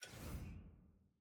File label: hover; archive jayce